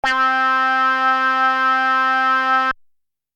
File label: funky wah C, synths